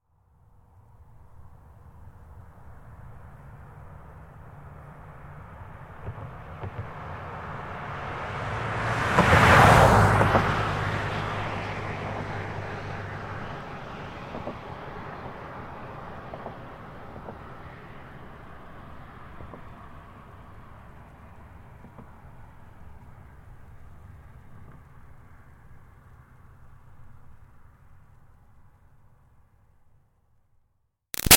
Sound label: Car Highlander By Med-Fast; 2005 Toyota Highlander V6; Cars; Vehicles; Big Room Sound